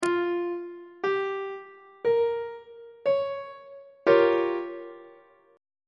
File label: g half diminished 7th 3rd inversion on treble clef